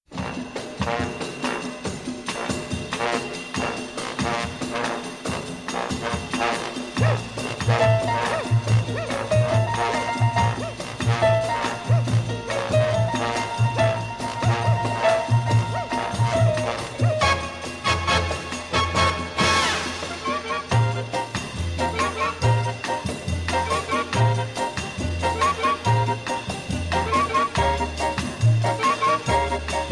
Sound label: Bossa Nova